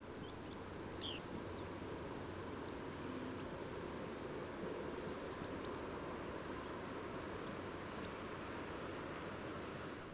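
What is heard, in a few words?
flight alarm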